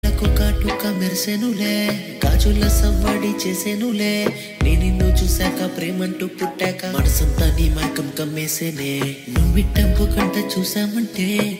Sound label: Rap Ringtone, Telugu